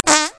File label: success